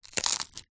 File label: human ice cream cone eat bite crunch